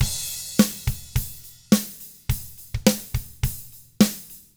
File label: pop rock drums; 105POP ROCK